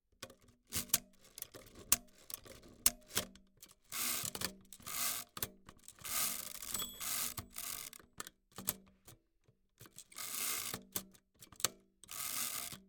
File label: mechanical calculator; grimme natalis and company